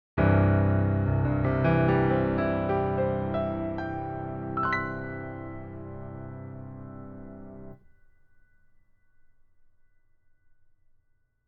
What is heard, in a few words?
piano